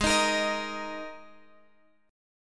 G sharp Suspended 2nd, G sharp, down, Guitar, Open F; strummed